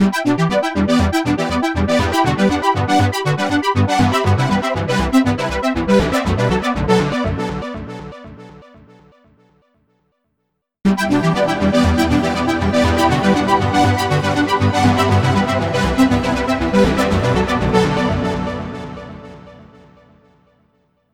synth medium hall dry vs

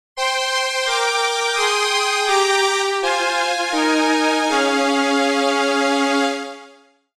omni string dry; stringer

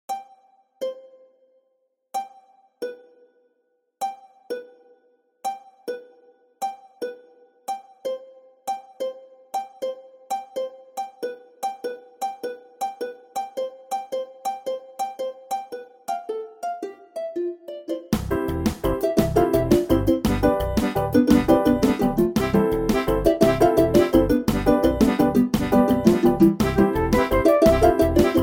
pajaro campana bellbird synthe

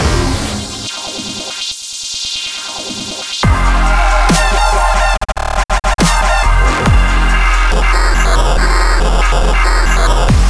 dubstep

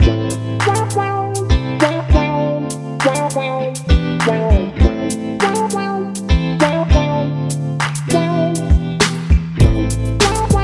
hip hop guitars

